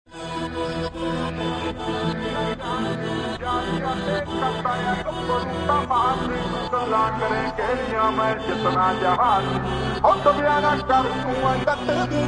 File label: Drill Beat